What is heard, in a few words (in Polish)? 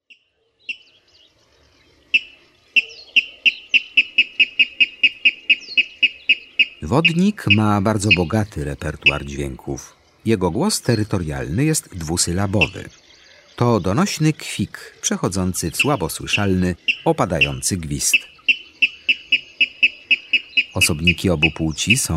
Wodnik, Ptaki; Kurs PTTK